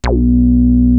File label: micromoog